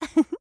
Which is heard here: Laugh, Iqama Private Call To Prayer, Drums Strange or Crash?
Laugh